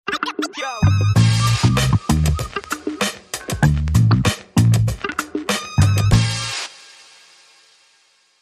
Bass, Stem

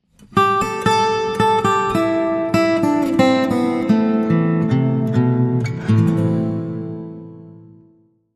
Link Sting

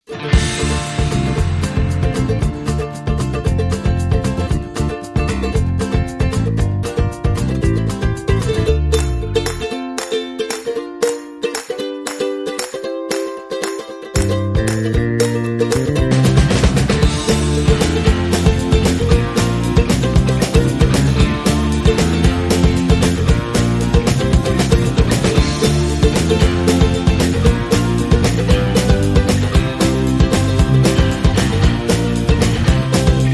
Whistling Strings Short Rhythm

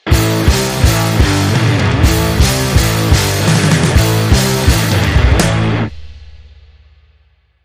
Doomsday Rock, Sting

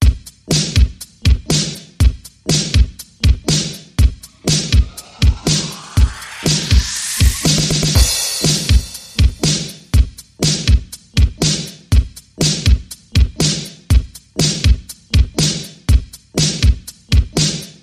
Mad Synthesis Beat

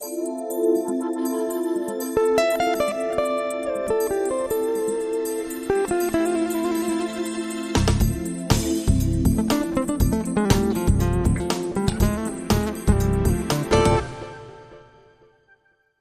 Funky Groove

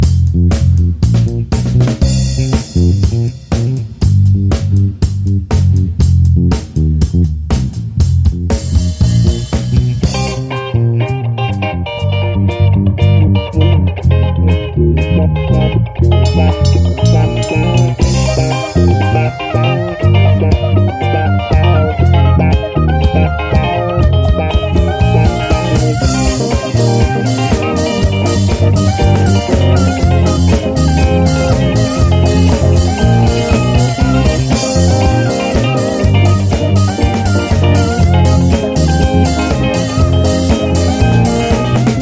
Disco, Inst

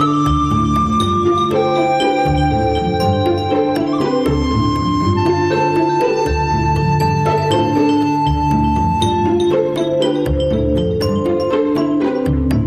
No Perc

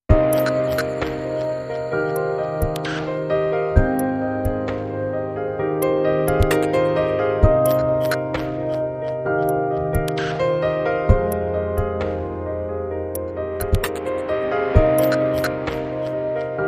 Ambient

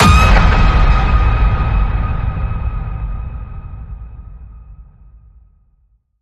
Ping It Loud D sharp

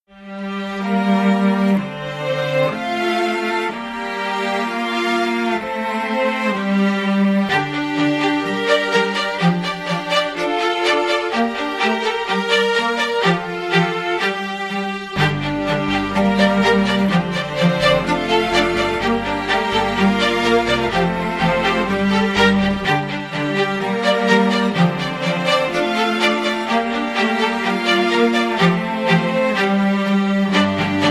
Regal Pulse No Drums

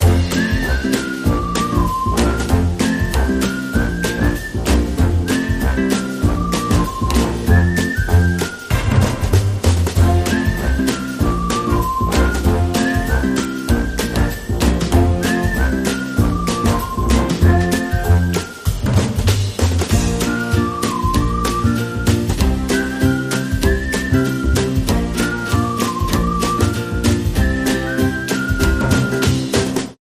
Whistles

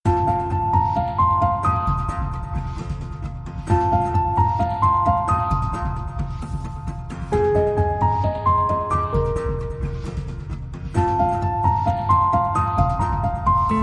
Glimmering Skies No Sitar Melody

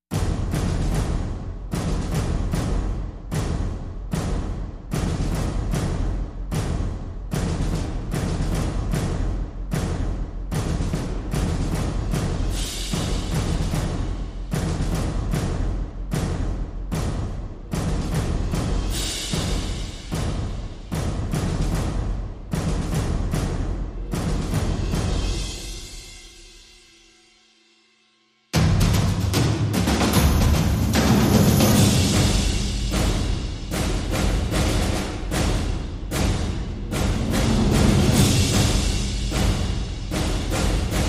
Percussion Only

